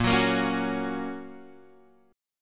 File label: A sharp Minor Sharp 5th, Guitar Drop D